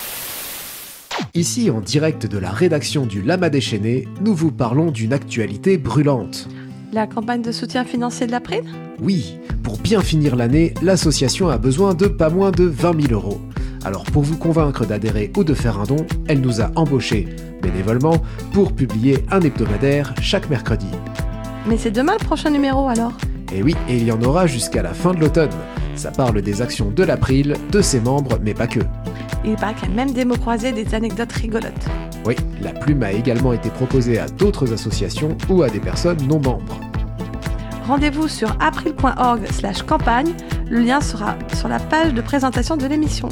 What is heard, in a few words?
jingle; lama déchaîné